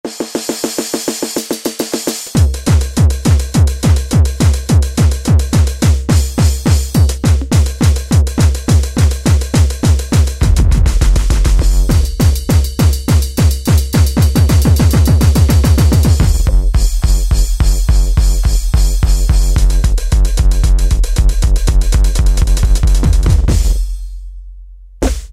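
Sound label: Hardcore Kit, Alesis QS8; Factory Preset examples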